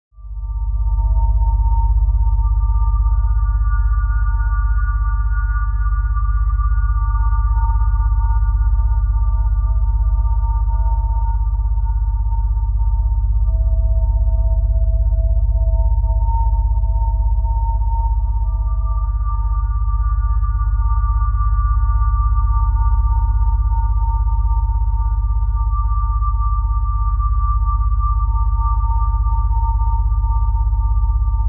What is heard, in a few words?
Drone